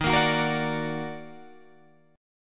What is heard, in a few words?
Eb Minor Major 7th Eb down Guitar Open G, chord